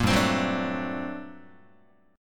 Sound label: G Minor Major 11th G down Guitar, GmM11 chord; GmM11 strummed; 1,3,3,4,1,1; JGuitar